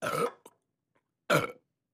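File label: Double Chunk Belch, belching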